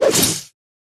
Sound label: bug dodge